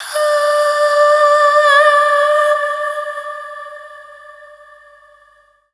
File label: FEMALE, Dance Vocals; Best Service ProSamples vol.12; AKAI